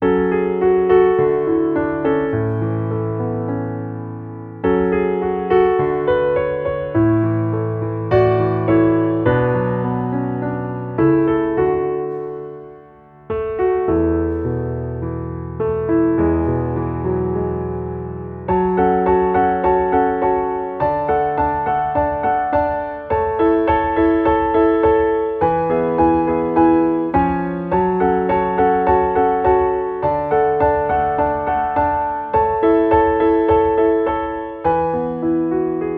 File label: Underscore PIANO; Worship Flow